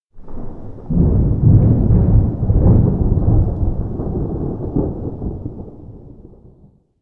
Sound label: thunder; anemoi